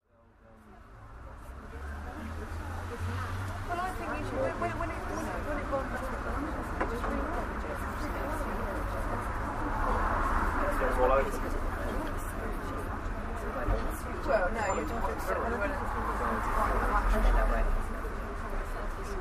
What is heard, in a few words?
small batch soundscape